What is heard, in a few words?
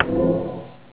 cold cone; Warcraft 3